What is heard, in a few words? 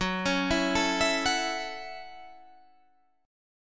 A 6th, F sharp, chord